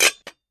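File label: Pot Lid Metal Closing, cooking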